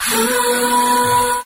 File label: "ha" SUNG; Voice; ooh ahh